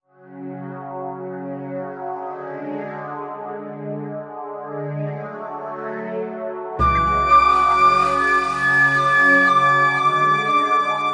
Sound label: Buddha Trance